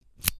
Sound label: Insert a key into a padlock